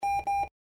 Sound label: beep error; garrysmod; glorified banking